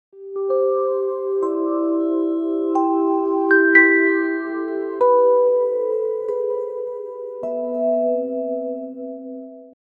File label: a dreamy and beautiful